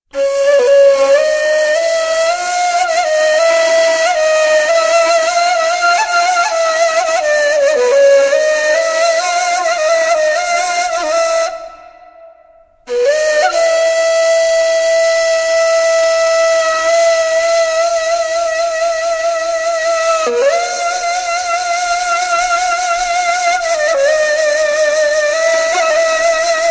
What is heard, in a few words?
Ney Taksim